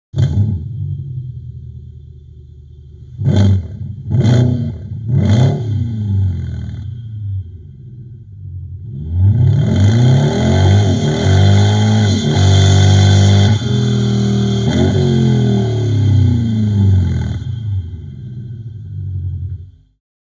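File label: Mercedes-Benz C63, Active Sound System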